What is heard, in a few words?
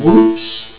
fail